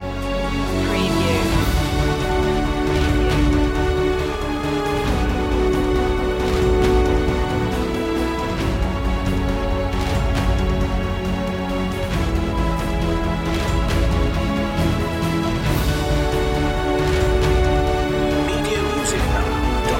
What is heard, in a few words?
no choir